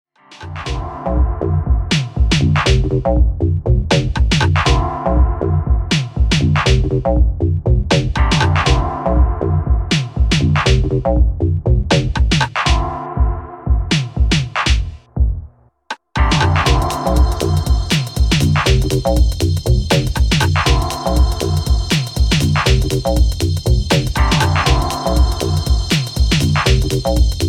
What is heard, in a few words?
Swing in Pads